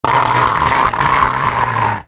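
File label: scream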